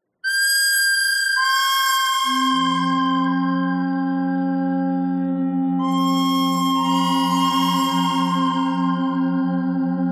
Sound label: bird thread pentatonic; noreverb